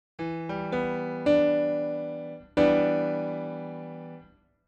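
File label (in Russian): малый минорный септаккорд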